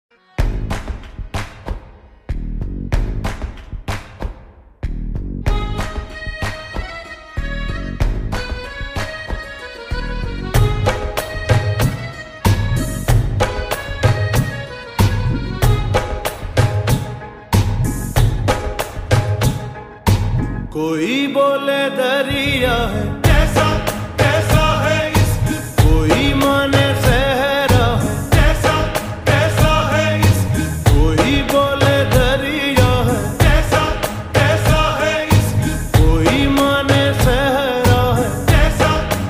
slowed reverb

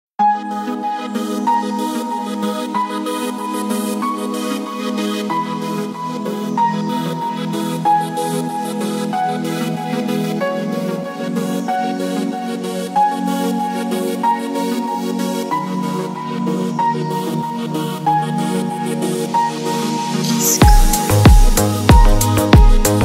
relaxing deep house mix

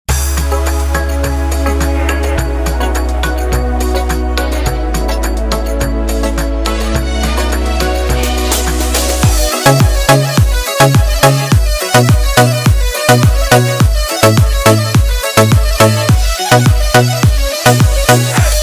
arabic remix

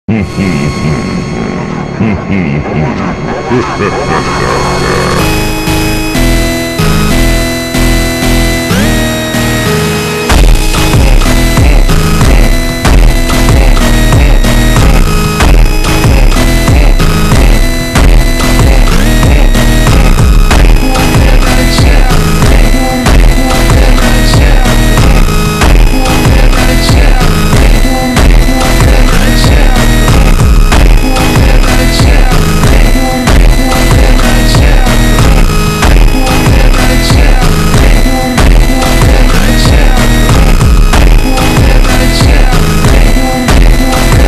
ultra slowed reverb

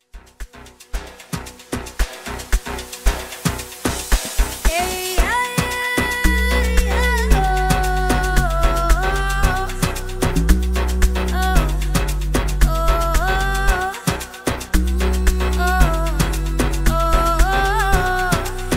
amapiano; Amapiano 2023

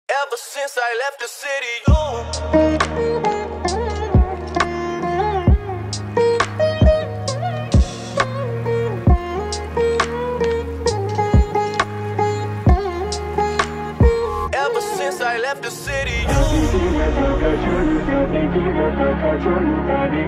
cinematic lofi

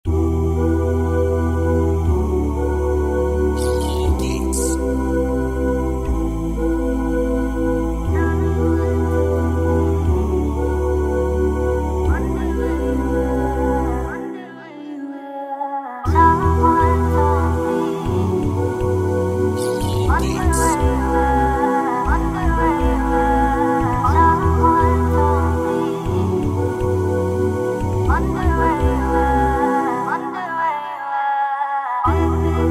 nasheed background vocals only

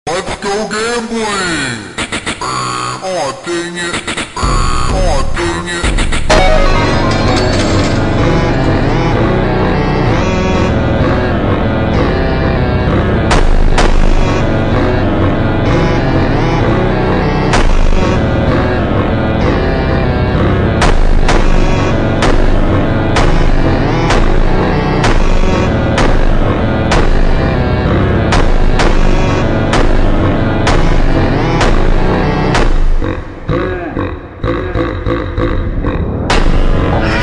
slowed reverb; TİKTOK REMİX

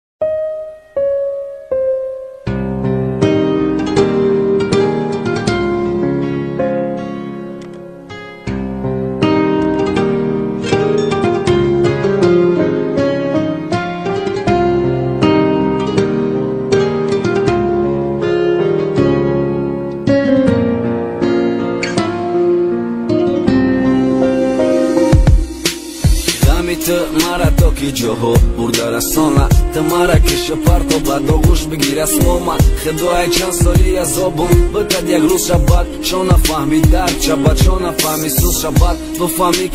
new rap

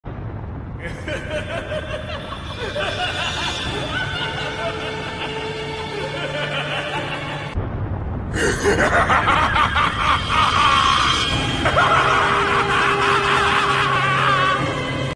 psychotic laugh; sukuna; sub vs dub; jujutsu kaisen s02e16

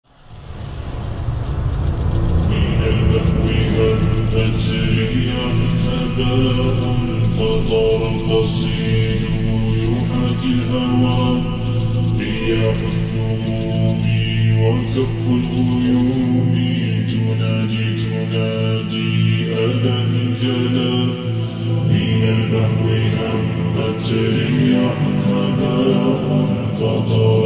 grieving cry slowed reverb

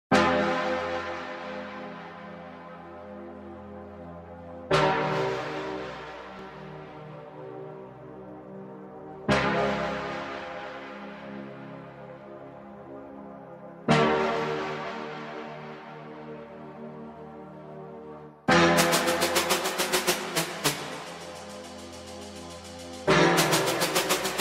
slowed reverb

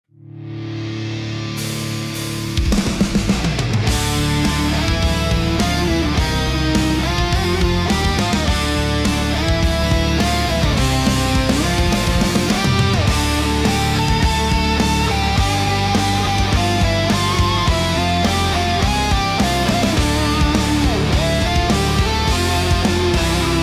metal cover

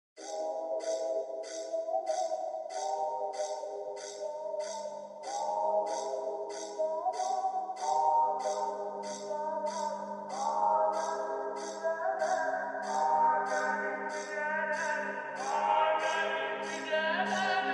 super slowed reverb

